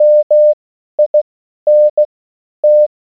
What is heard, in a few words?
Morse Code